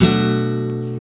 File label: GUIT, Gipsy